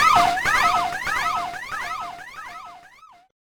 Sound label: Death Voice